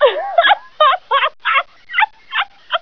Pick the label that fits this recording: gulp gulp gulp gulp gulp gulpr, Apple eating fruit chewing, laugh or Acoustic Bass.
laugh